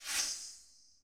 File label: Zing; Title Sequence Effects